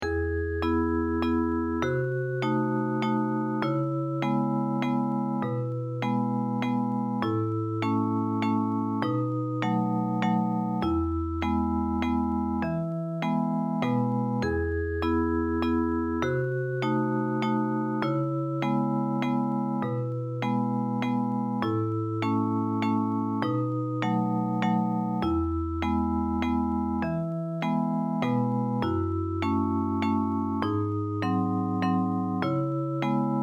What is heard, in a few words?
simple waltz